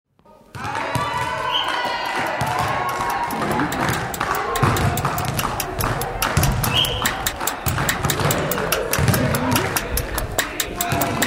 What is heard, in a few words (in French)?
Musique Gymnase